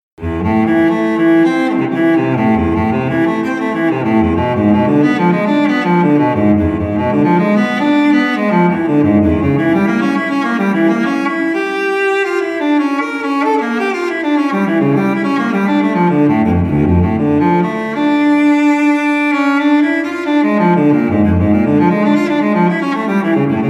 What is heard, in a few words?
solo cello passion ringtone